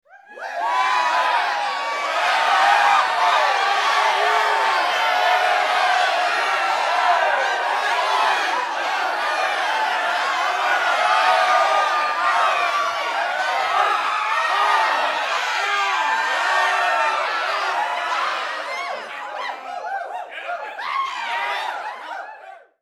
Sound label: Crowd Cheer